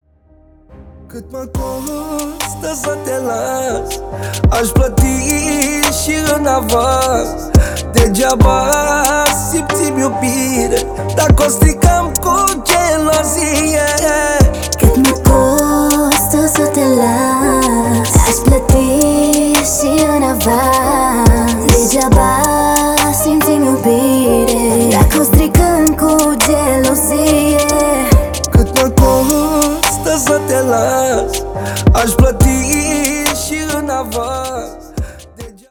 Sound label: sax